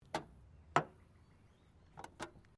Car Mazda Rx7 1983 Series3 Exterior Petrol Cover Open; Cars; Vehicles